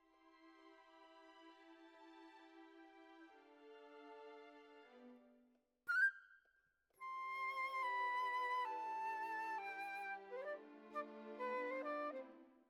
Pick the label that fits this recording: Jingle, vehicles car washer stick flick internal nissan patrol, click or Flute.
Flute